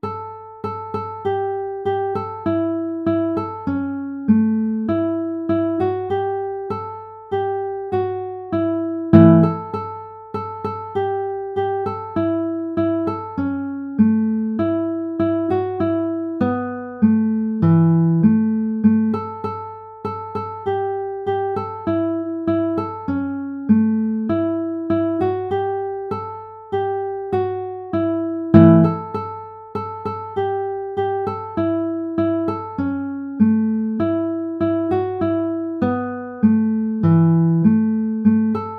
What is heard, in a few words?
banjo